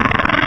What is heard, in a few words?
Pig, Animals Wavetables